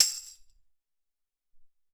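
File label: Tambourine, Alesis SR-18 Drum Machines; 300 Drum Machines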